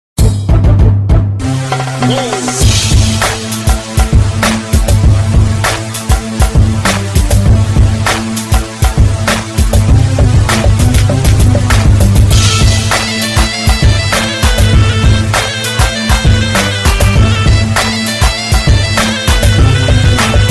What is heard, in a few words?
hip hop arabic ringtone